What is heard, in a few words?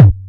kicks